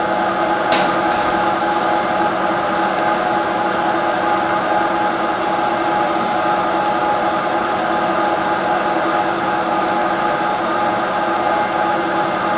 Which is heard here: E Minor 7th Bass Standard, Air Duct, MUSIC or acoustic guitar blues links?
Air Duct